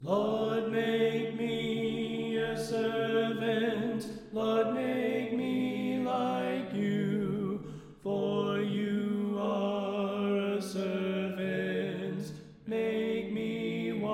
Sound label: Tenor Left